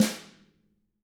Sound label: hit; ILIO; Double Platinum Drums 1; DW